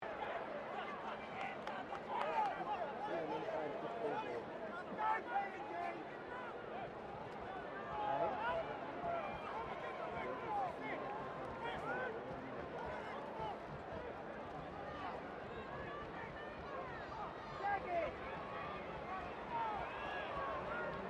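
crowd 15K Rugby World Cup distant loop, Rugby world cup game; Crowds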